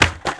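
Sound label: ollie concrete, terrains; Tony Hawk's Project 8; XBOX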